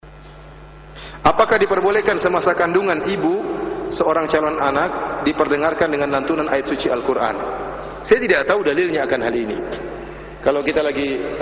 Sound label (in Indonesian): murottal; Ustadz Firanda Andirja, MA